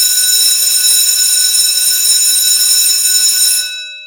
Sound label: School Bell